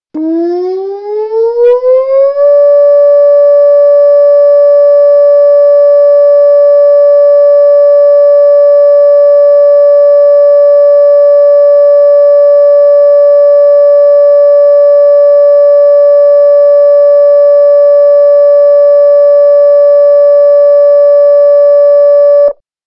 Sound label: Emergency Siren